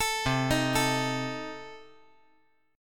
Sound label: C Minor 6th C